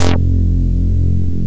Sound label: synth